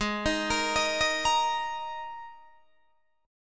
G sharp Suspended 2nd, G sharp, down, Guitar, DADGAD